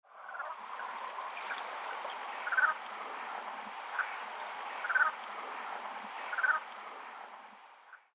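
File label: brant goose, hakodate; koe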